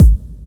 kick drum one shot A key, kick one shot tuned to the A note